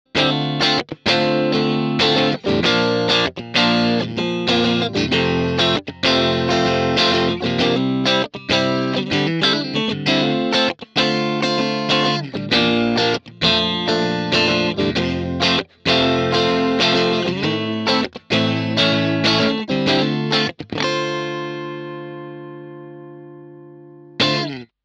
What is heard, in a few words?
vox valvenergy mystic edge